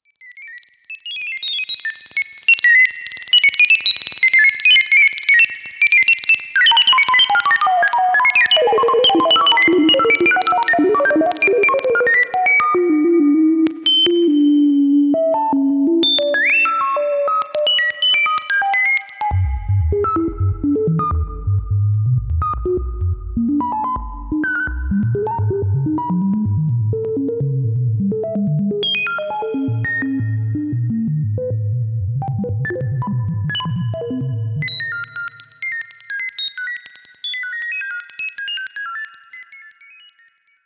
OB-1 VCF Sample and Hold

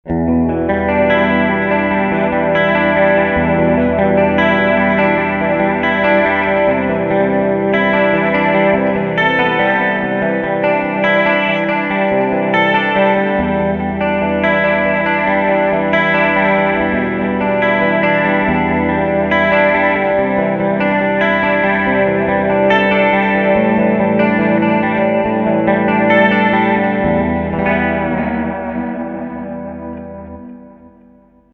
clean delay

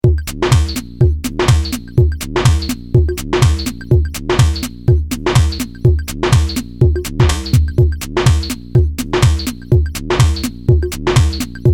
older school

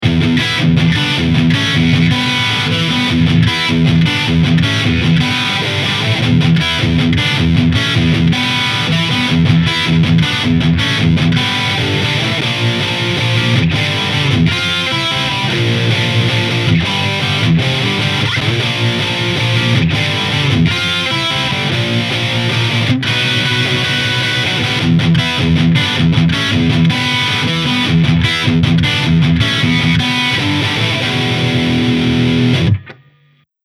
Engl RB 100 Lead High Gain

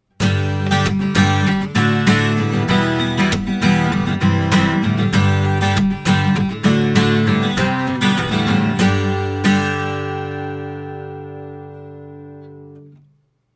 Acoustic Guitar; Apogee One

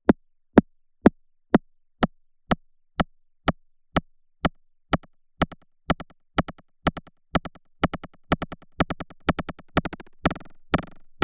FOLDBACK 808

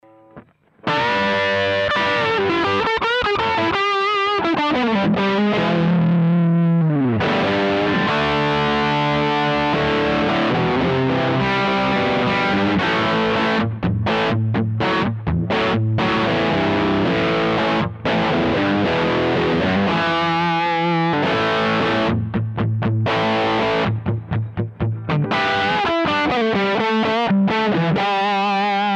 Overdrive Channel plus OTS DI

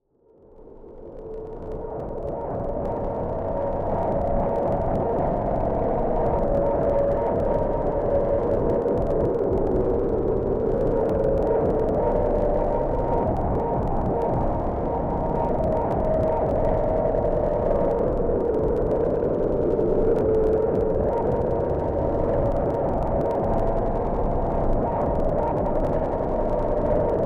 Erica Synths Noise System; Liquid Sky Dada Noise System 2; Opus Ausschnitt

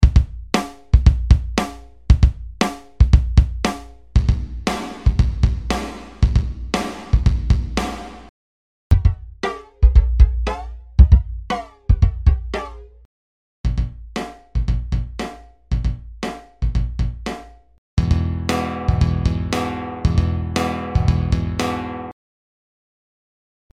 Ariesverb first dry

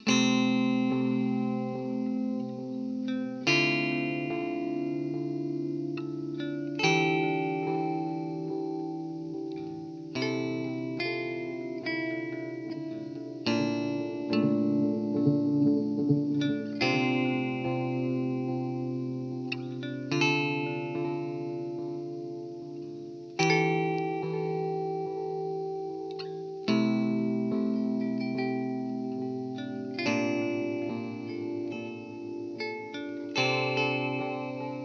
Teisco Delay

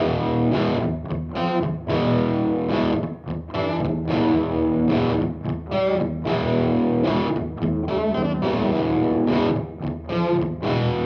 Chime; Strymon Iridium

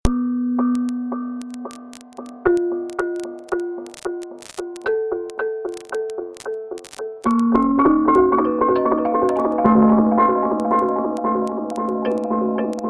Gamelan trashed

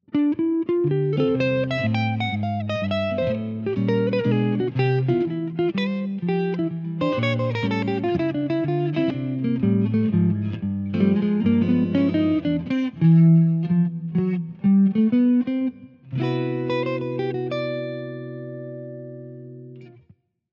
Jazzmaster Jazz Dark

